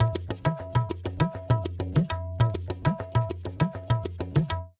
distant rhythms

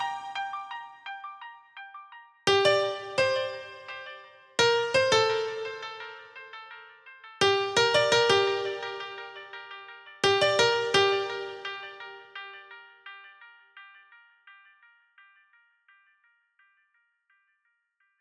piano

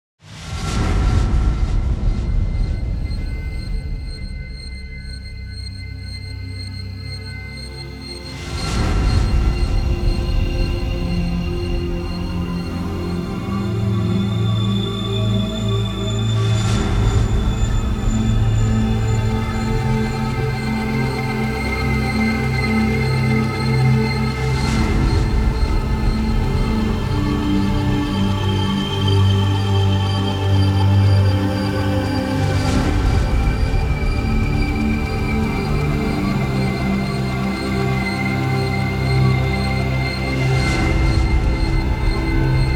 dronar metal and gla; starfield

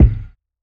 kick

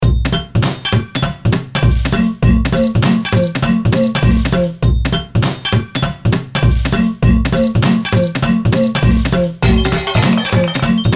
foley trash

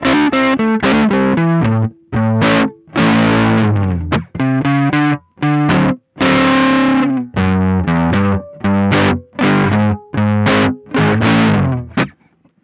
pedals fuzz; just pedals

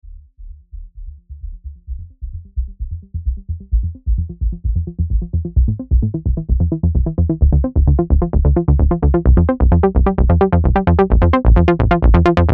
discovery, progressive

